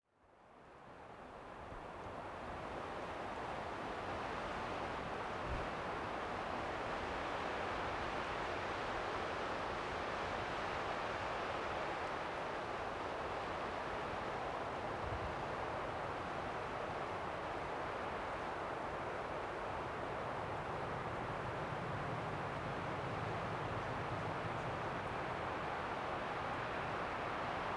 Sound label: Wind 4B, Alberta Lodgepole Pines